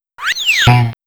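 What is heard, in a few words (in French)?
Chute pourrie, Saut et atterrissage peu glorieux; Goblins 3; cartoonesque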